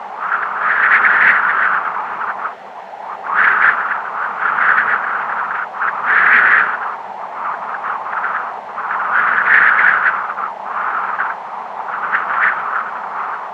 wind fence whistle; Call of Duty Modern Warfare

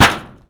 roadsign impact

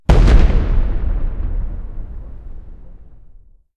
aa explosion far

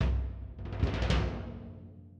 music interactive; World of Tanks

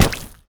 muck run

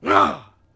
hit vox; Civ4 warlords

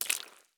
walk puddle; Escape from Tarkov

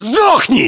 melee attack

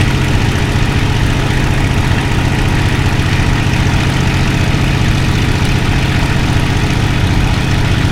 mustang cockpit, engines; War Thunder

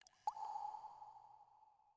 water drip; Rust

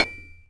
Drop Axe; Civ4 warlords

